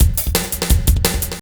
breakbeats

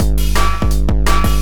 breakbeats

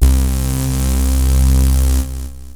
syn, loops synth

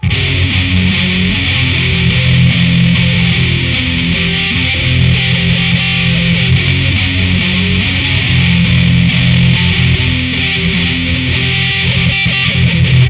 hardcore guitar loops

